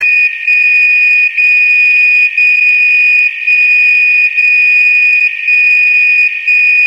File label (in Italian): dcf audio campione, dcf77